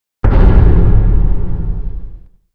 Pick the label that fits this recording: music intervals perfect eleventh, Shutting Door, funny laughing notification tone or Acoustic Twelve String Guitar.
Shutting Door